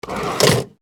Cash Register drawer open; Max Payne

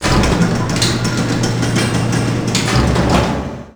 garage; Matt Hoffman's Pro BMX 2; XBOX

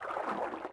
water stop, footsteps

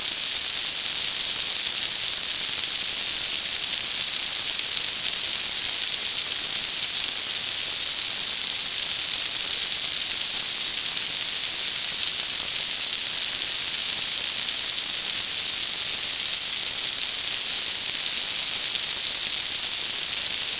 rain; Terminator 3 Rise Of The Machines; XBOX

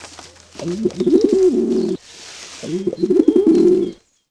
pigeon; animal; Tony Hawk's Project 8; XBOX

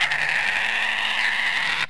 Monkey; Ambient; MX vs ATV Unleashed

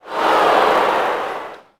Ooh, Crowd, reactions

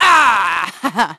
GRUNTS; VOICE; CARENA; Pulse Racer

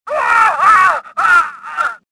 death; Star Wars Jedi Knight II Jedi Outcast; XBOX; stofficer

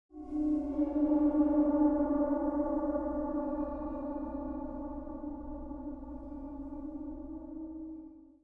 MUSIC

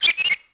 bird; Tony Hawk's Project 8; XBOX

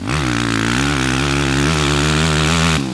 Power, Engine

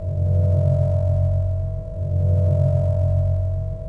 column hum; Star Wars Obi Wan; XBOX

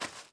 fs sand, footsteps

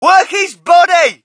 crowd, shout; Mike Tyson Heavyweight Boxing

